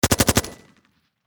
Warfare Machine Gun 5.56 Caliber Heckler Koch HK G36 Short Burst Distant Perspective